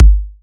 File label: 128BPM Kick; Zeta; Vengeance Samples; Zeta Kit